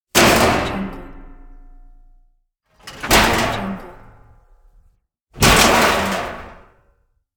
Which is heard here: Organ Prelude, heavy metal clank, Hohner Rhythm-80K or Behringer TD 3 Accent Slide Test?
heavy metal clank